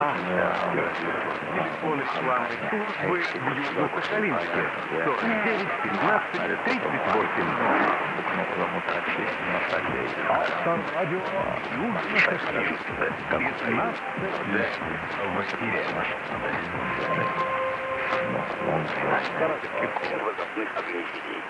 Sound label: 531KHz; AVTORADIO